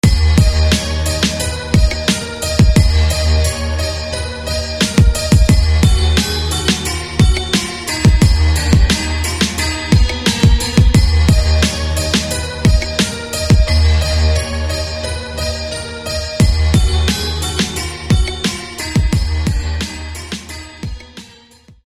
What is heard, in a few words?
Piano; Blacksmithe; SFB FX